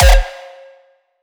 raw kick

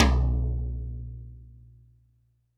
TD-20 Tom A, Roland TD-20 Toms

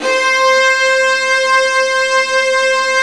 STR, Vas Bright; Roland L-CD702; Vlas Sect1-2

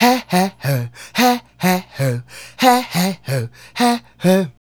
Male Solo, Hip-Hop and RnB Vocals

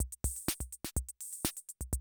CR78 125, drum loops

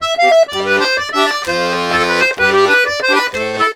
Accordions; USB Soundscan vol.40 - Complete Accordions; AKAI

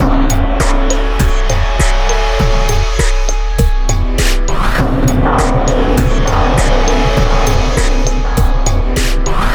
MIX, BACKGROUNDLP; Best Service ProSamples vol.49 - FX-Area; AKAI

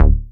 BAS D#1, 2 Step; USB Soundscan vol.51; House Side Of 2 Step; AKAI

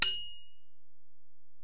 Ac Perc, Drum Machines Alesis DM-PRO

